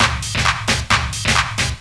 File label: El Perc Loop; Sonic Foundry (Sony Creative Software); Crimson Blue and Fabulous Horncraft 4 RnB